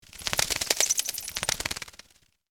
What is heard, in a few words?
Bat squeak chirping close perspective